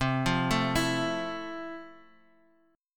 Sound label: F Major 7th, C